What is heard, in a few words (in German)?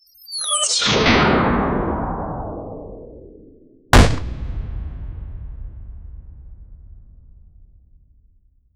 ein Meteor der auf die, woosh